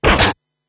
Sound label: Explosion; Kairobotica